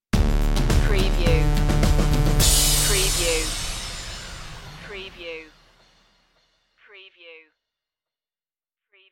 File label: second sun stinger